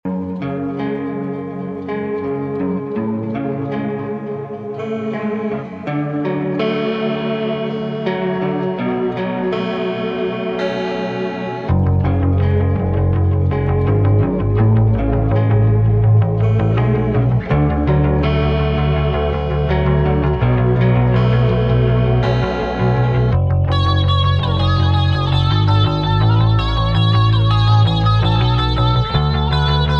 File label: Space 165bpm F minor